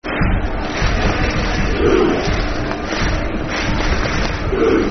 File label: Blues Clap, Football Chants (Soccer Songs); Cardiff City Ccfc